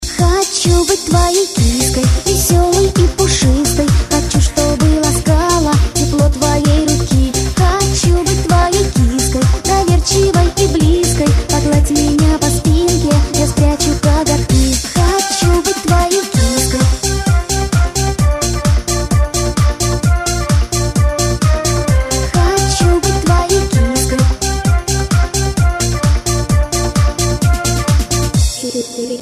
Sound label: ringtone